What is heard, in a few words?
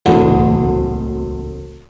Bell; clock